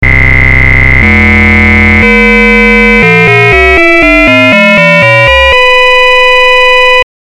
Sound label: linFM